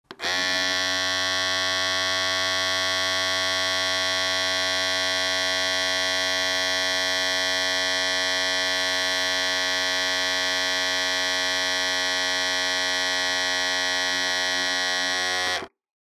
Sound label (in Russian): zvuk mashinki dlja strizhki